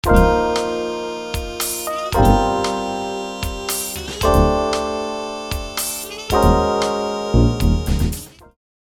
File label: Sound Logo Jazz